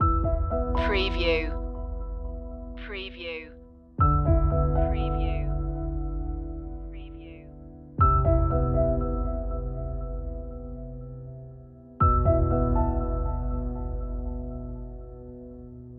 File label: frozen memories loop